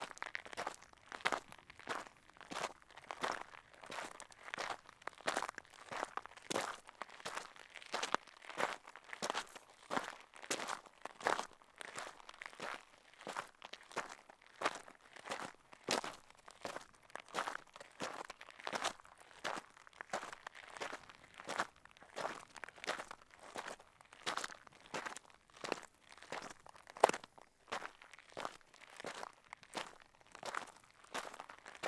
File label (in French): desert